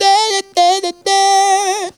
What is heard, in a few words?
Funky Vocals; USB Soundscan vol.18; AKAI